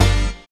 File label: SYN, dance; Sound & Vision - Gigapack II CD 1